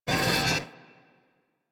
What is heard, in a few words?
Piston Raise Short; Warframe; Ambience; Eidolon Plains